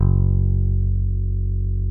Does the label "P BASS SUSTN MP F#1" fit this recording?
Yes